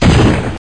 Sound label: player dead; Space-odyssey